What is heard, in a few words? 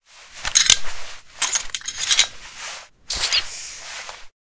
antirad use, item usage; Stalker Anomaly